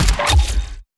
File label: fire weapon